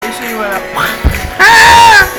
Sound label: scream